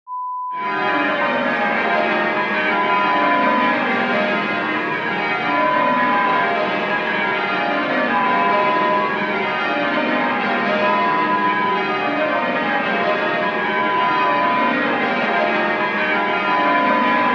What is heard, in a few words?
Wedding Bells sound